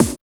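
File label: HOUSE SNARE DRUM; E-Lab; X Static Goldmine 1; akai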